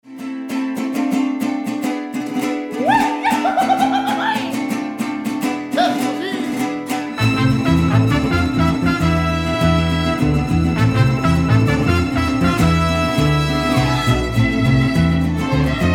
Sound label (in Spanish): mariachi; el cascabel